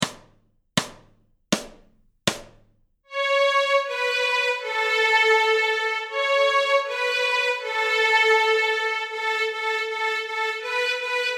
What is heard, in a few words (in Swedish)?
Stråk A, Nyckelharpa; Köp varm korv; A-strängen Steg 1 Två toner i dur